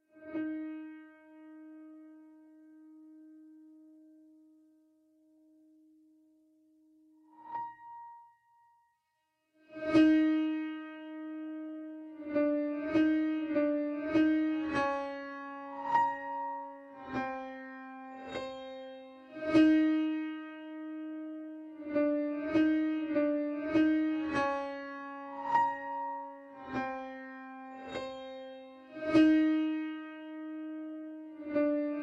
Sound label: piano reverb